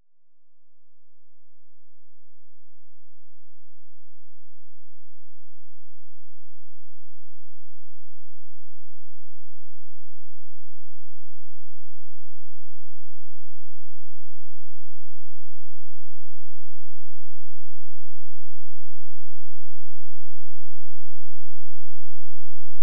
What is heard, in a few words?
Ramp Signal 24bit Mono